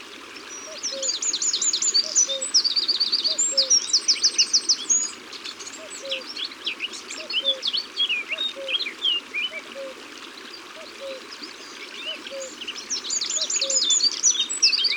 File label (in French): Troglodyte mignon, Au bord de l'eau